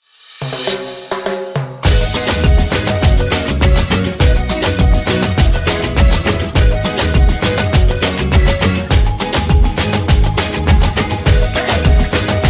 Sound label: SAMBA 51 BPM